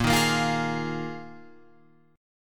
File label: Bb 7th Suspended 4th Bb Guitar Open F; strummed